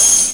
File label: Heartbeat; SNES; Appleseed - Prometheus no Shintaku